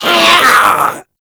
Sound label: Infested Runner Attack Call; Warframe; Runners